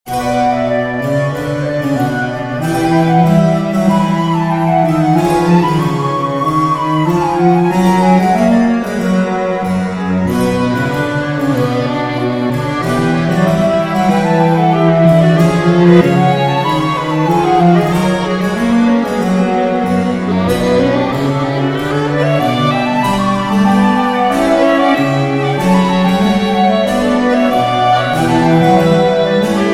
Flute sketch in b min; trio in b minor wip

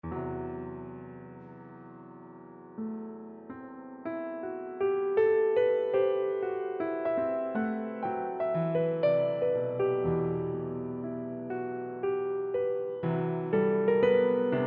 Piano

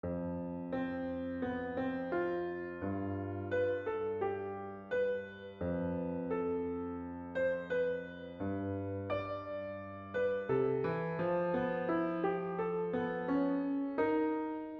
Serenade Violin Piano; wistfull serenade a brief string trio

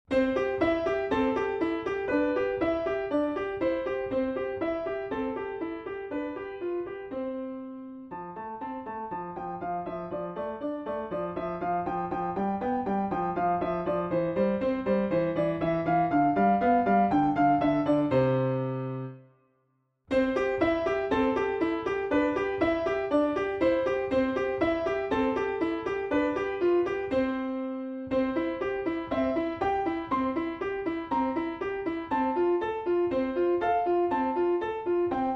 Piano

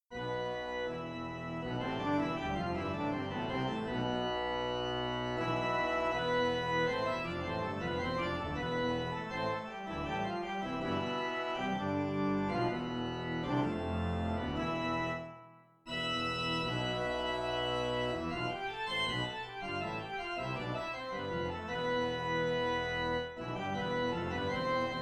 Soliloquy for Accordion

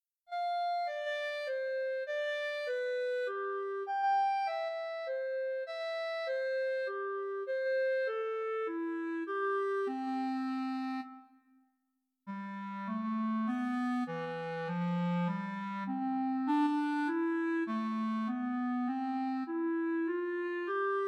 Soliloquy for Clarinet

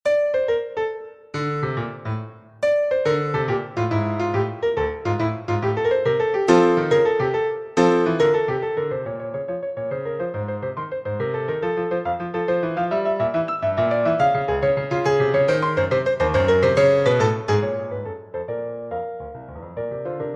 Preludium

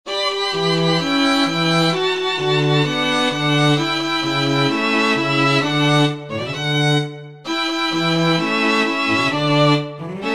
String quartet section

